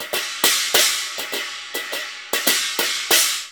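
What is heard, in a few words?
CHINA, China cymbal; Spectrasonics - Heart of Asia CD2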